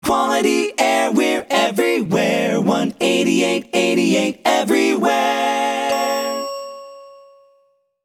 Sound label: Quality Air LOGO, acapella jingles